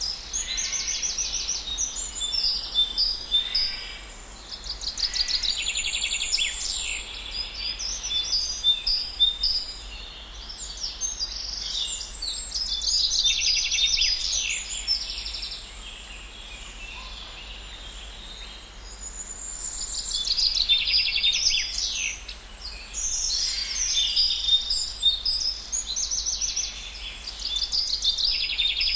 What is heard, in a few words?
Country Side Loop; Environment; BackgroundLoops